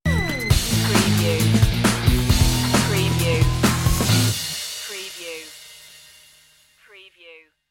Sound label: burn out stinger